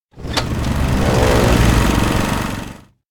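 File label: electronic plane flight outdoor; anemoi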